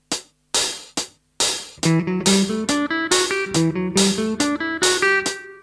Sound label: natural minor scale